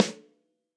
Snare Hit & Rim Old School; Drum Hits; Ableton; Factory Packs; Session Drums Studio; MPE Hits

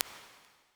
Arctic Echoes; Experimental; Ableton; Convolution Reverb

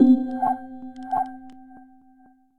Bath Tub Tones, Fleamarket Percussion; Instruments; Ableton; Factory Packs; Lost and Found

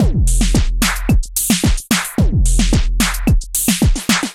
Kit groove 110 bpm; MIDI Clips; Moscow UHF; Ableton; Drum Essentials

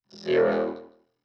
120 Neo-Wave Vocoder; Pop Rock

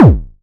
modular attacked, Kickbank; Ableton Foundation FreePacks

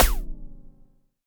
Alien FM Perc Short

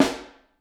Snare Overhead

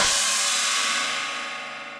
China Low Loud Squashed, Cymbal; Drums; Drum Hits; Standard Hits; Ableton; Factory Packs; Session Drums Club

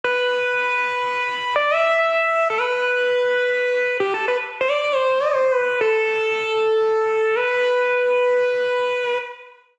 Strange Leap Melody E Minor 91bpm; MIDI Clips; Melodic; Ableton; Factory Packs; Chop and Swing

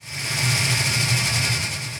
Dark Textures; Forest Fear Train; Multisampled

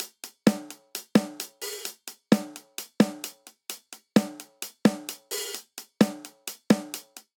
Driving Rock Snare & Hihat 130 bpm; 4 4; MIDI Clips; Ableton; Factory Packs; Drum Booth

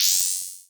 Perc; Ableton Foundation FreePacks; Wicked Kits

